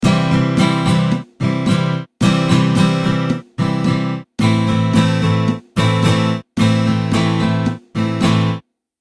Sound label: strumming pattern